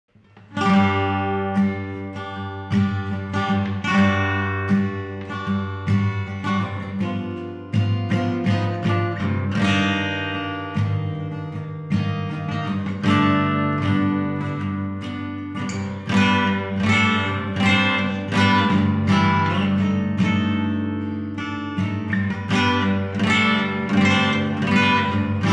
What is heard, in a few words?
acoustic